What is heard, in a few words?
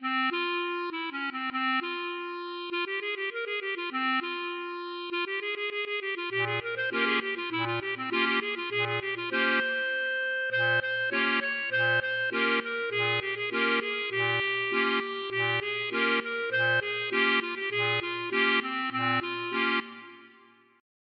klezmer